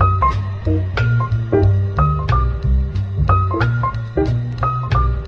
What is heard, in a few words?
piano, Phonky loops